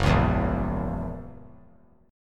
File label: F sharp 7th, down; strummed; JGuitar